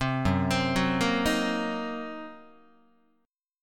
F sharp 6th Flat 5th F sharp down Guitar; arpeggiated; JGuitar

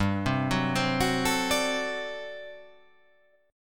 G Major 7th Suspended 2nd Suspended 4th G down

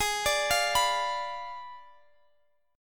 G sharp Diminished 7th, Guitar, Open D; x,x,18,20,20,21

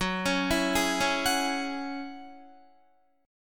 Gb 7th Suspended 2nd Gb Guitar; Gb7sus2; arpeggiated

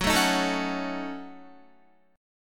Gb Minor 6th Gb down Guitar; strummed; JGuitar

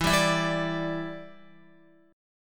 E Minor 7th, down, Guitar; strummed

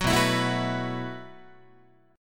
B 7th Suspended 4th B down Guitar

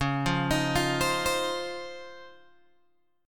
C sharp Major 9th, Guitar, Open G

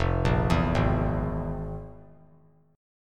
F Minor Major 7th Double Flat 5th; FmM7bb5 arpeggiated; JGuitar

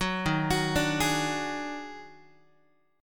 Eb Minor Major 7th Double Flat 5th, Guitar; JGuitar

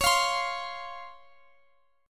Db Major 7th, Guitar Open D

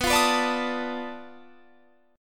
B 7th Sharp 9th Flat 5th, down

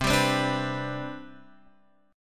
C Major 7th Suspended 2nd C, chord; CM7sus2 Chord {8 10 10 7 X 7} strummed

